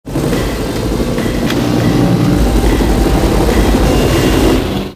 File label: copter